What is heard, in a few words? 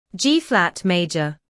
G flat major